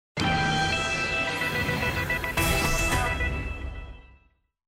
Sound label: JINGLE LONG ULTRAMARINE